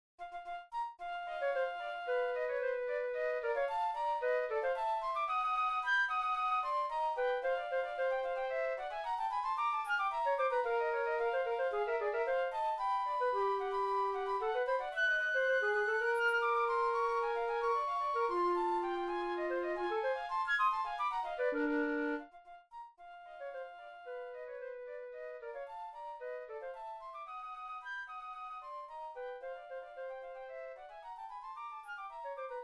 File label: Flute Duet